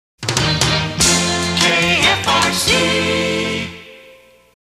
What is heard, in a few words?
With Music Mid, Jingles; KFRC